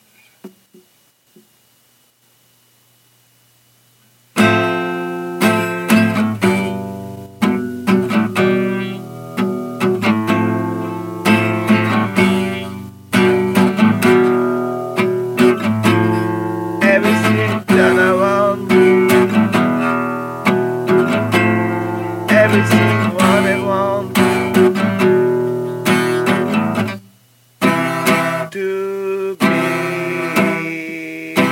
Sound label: Live Acoustic Session